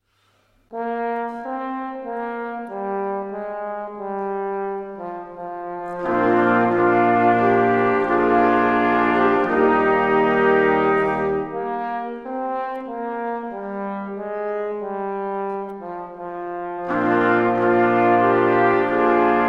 Blaeserensemble; Blaeserensemble des Guerzenich-Orchesters

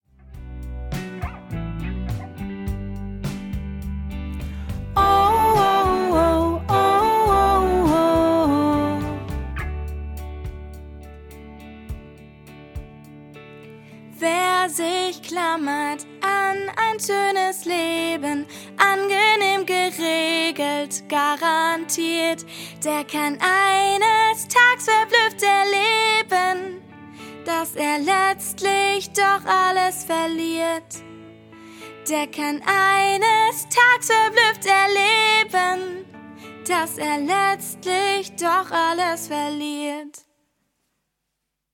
Lern Sopran und Solo

